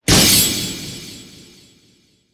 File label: Wafu Katana; Yu-Gi-Oh! Master Duel